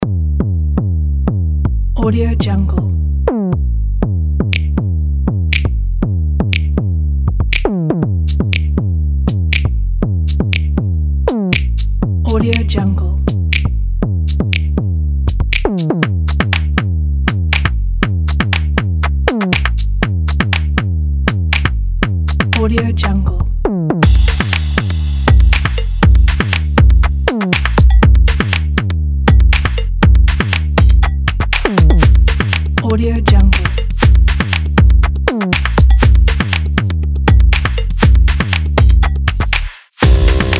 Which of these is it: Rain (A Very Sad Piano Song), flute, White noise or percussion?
percussion